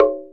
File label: Tongue Hi; Multisamples; Digital Sound Factory Planet Earth